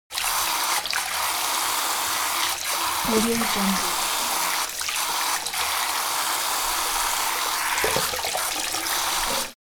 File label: elements water sink forced flow wash; smartsoundfx